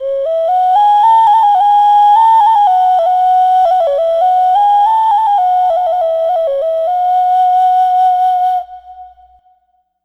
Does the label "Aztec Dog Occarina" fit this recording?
Yes